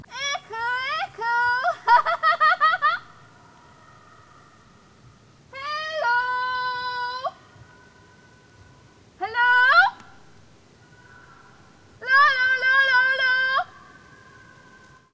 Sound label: Echo